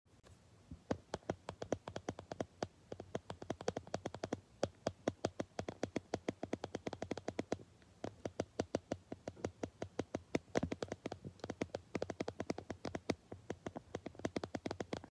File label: touch phone